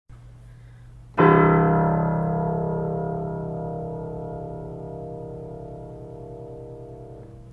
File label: C1 upright